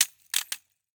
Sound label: Rollerskates, old fashioned, all metal, wooden wheels, clash, strike, clatter, ping, double, loose; Eiravaein Works; Vaeyan IV